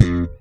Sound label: SLP POP F, PEDULLA BASS; Masterbits CLIMAX 7 - Bass